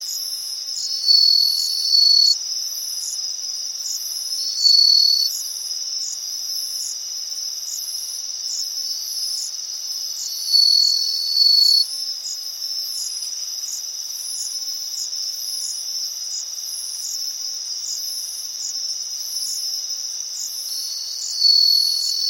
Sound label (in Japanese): suzumushi